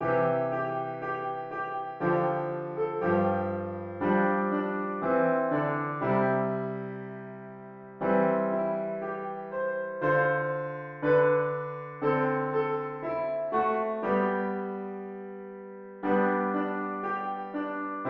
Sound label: onward christian soldiers piano